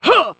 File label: "huh", voice; ooh ahh